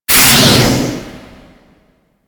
Grineer Space Pod Thruster Reverse Burst, Vehicles; Warframe